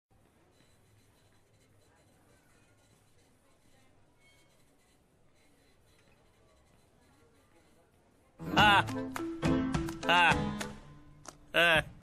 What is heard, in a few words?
sloth laugh; zootopia